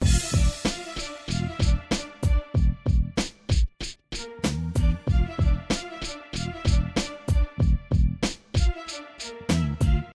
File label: Mean Hip Hop Rap Beat